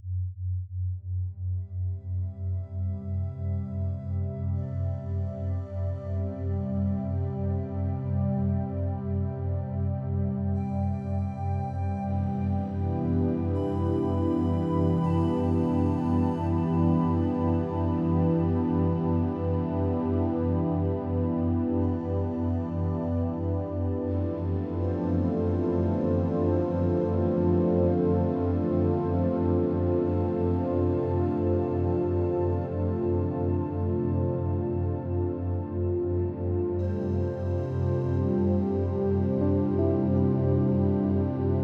BB 3Hz mit Ambient Sound, binaural; Delta; Erneuerung

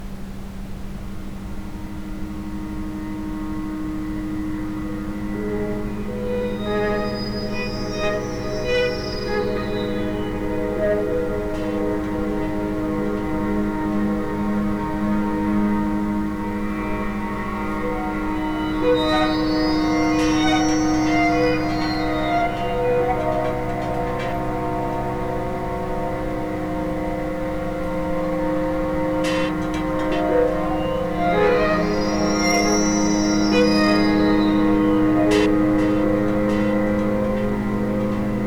mit Ambient Sound; chakren; Muladhara Power

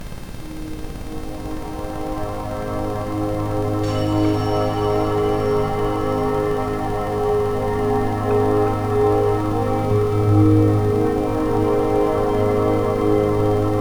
Rife Regenesis Rhythmus mit Ambient Sound; rife frequenzen

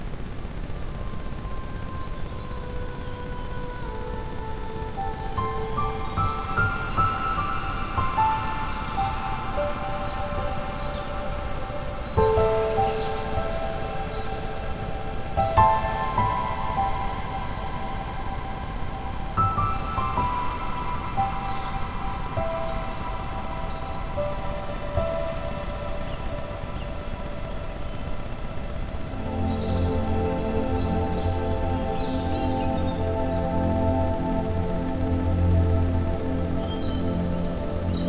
Rife Sonnenaufgang Schub mit Ambient Sound; rife frequenzen

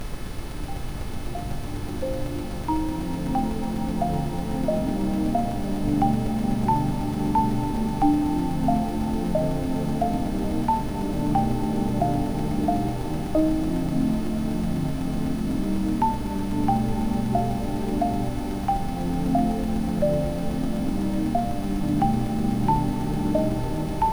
Rife Muse Melodie mit Ambient Sound; rife frequenzen

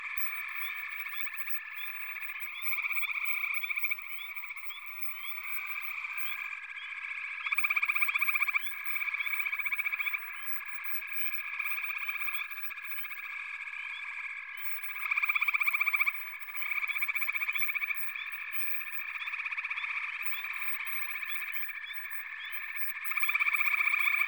Natur Klangwelten Frosch Chor am Teich; naturgeraeusche; Silentsubs

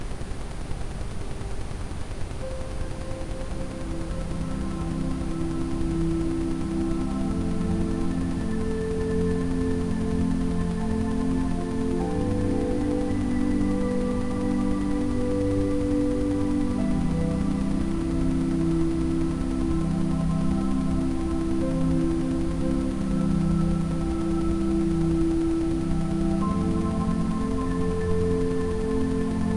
Rife Stress Aufloeser mit Ambient Sound; rife frequenzen

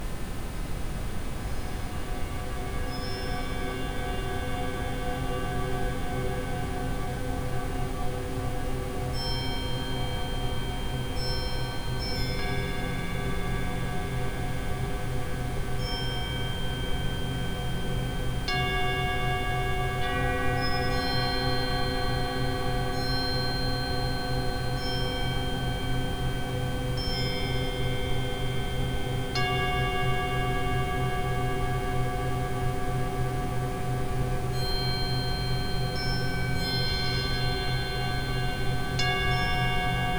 Rife Wurzel Revival mit Ambient Sound; rife frequenzen

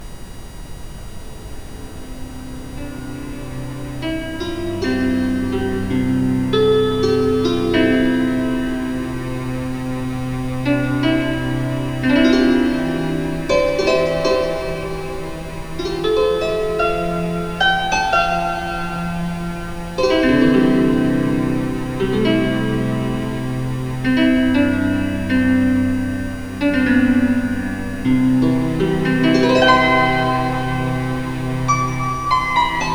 Rife Unendliche Weite mit Ambient Sound; rife frequenzen

